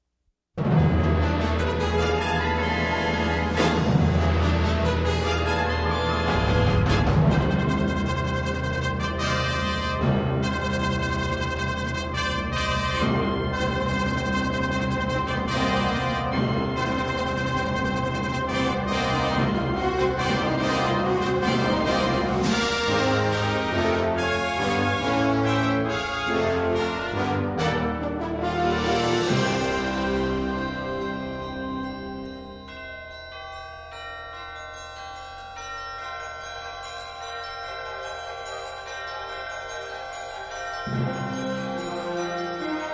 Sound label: Fanfare